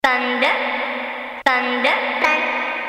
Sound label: Thunder